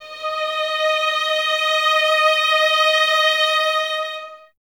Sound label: Violins Soft D#5, String Ensembles; Miroslav Vitous; 11 Violins